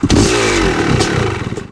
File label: moto start; terrains; motox; Tony Hawk's Project 8; XBOX